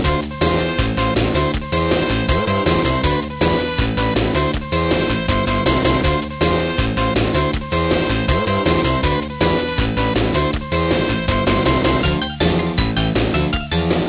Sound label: toilet music